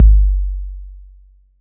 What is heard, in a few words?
BD 808